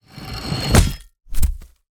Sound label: guillotine fall impact blade thud squelch head land on ground or in basket; horror; zapsplat